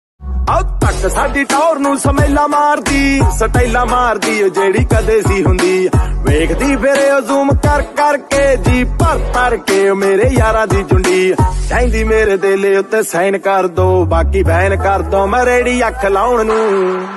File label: Punjabi Tune Ringtone